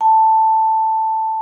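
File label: vibraphone A4; Xsample VOL-4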